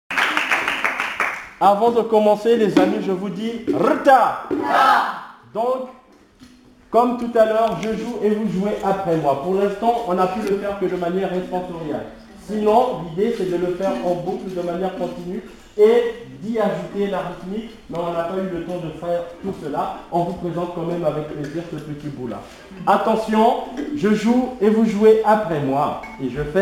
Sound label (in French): lamellophone du congo sanza